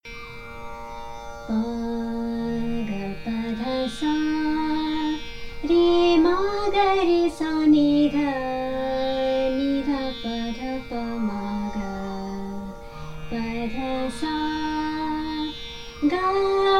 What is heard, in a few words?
Pahadi Sargam